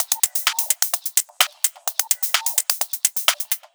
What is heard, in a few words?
Minimal Loops